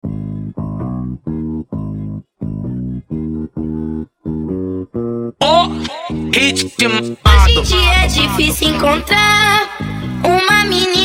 bombox funk

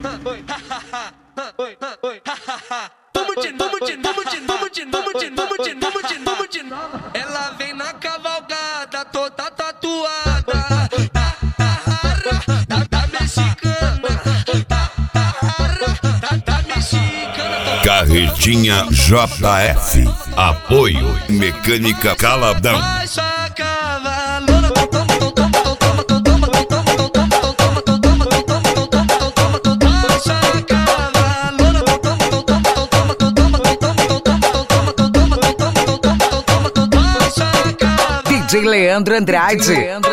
funk mega funk

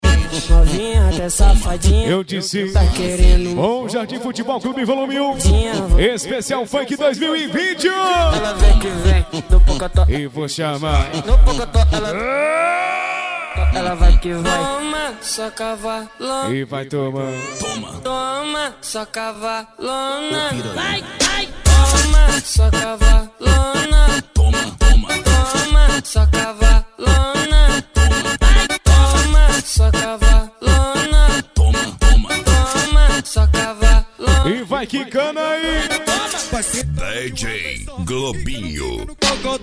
funk pisadinha sertanejo

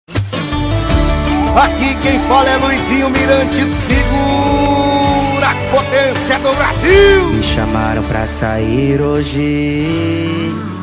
sertanejo com locutores de rodeio

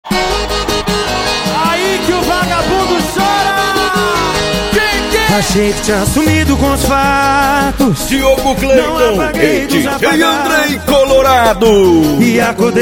sertanejo com locutores de rodeio

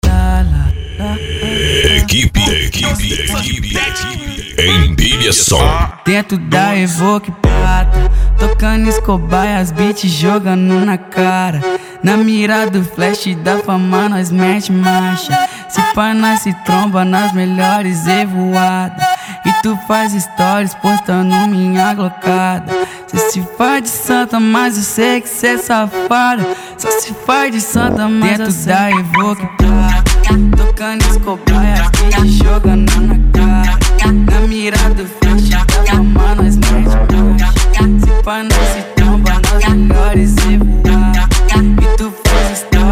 electro funk

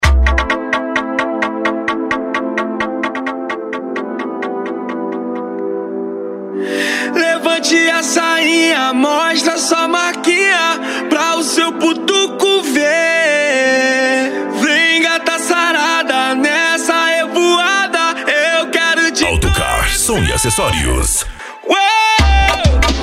funk agressivo ritmado e funkadao

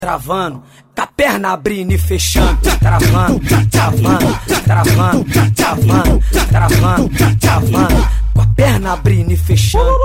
funk bass

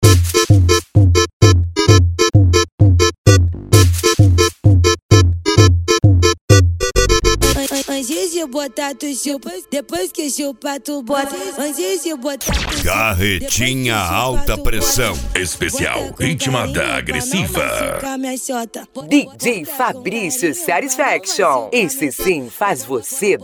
ritimada agressiva

